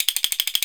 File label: CASTA ROL, CASTANETS; AKAI S-Series CD-ROM Sound Library VOL-5